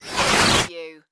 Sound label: Whoosh; Sound Effects Bible